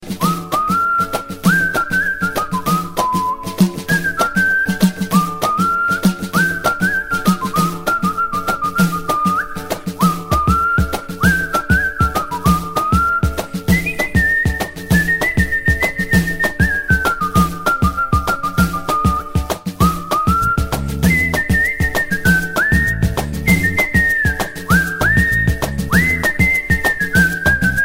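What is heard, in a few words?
arabic flute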